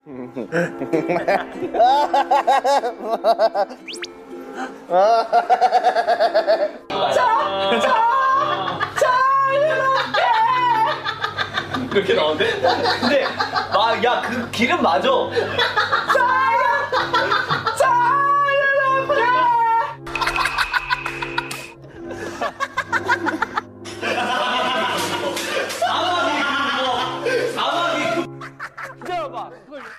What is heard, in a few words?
laugh ringtone